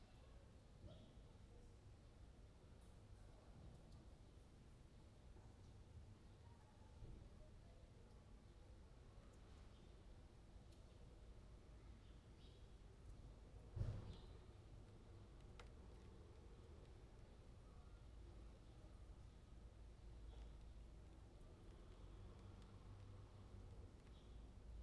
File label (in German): Regen auf Fensterbaenken; 6 Kanal; Fieldrecordings